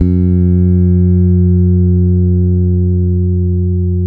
SOUL, Bass, G 3; Spectrasonics; Bass Legends

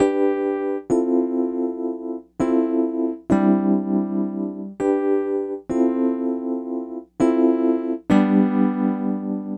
EP, Keyboards, Electric Pianos

